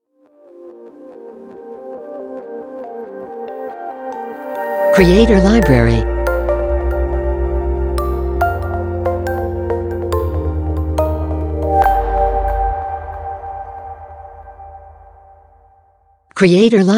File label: Floating Particles Logo